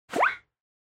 Motion Agility Low; モーション 俊敏; 低

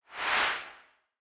Camera Motion, Low; カメラモーション04-2(低); シーン・カメラモーション

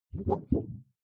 Plastic Sheet

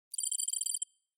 Cyber

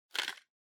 Portable MD Pick Up

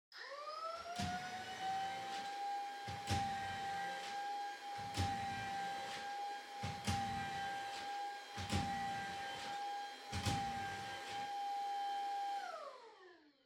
Vacuum Cleaner Far Vacuum Floor Low; 掃除機04-36(遠い バキューム 床 低)

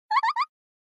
Horror Laugh Dry

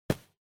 Cell Phone Hardware Put Down Comforter

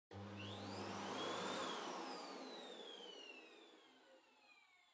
Vacuum Cleaner Far Vacuum Floor Soft High Still; 掃除機; 遠い バキューム 床 ソフト 高 静止

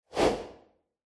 Camera Motion Mid; シーン・カメラモーション

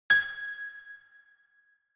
Piano High

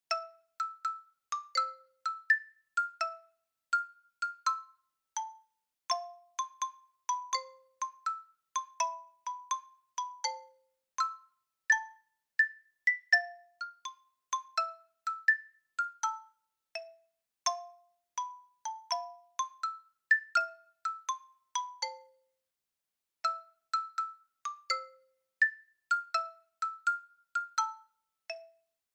Shuffle

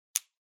Ballpoint Pen Click

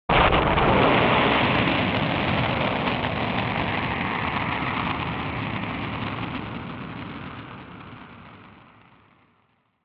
Retro Anime Explosion Low Long

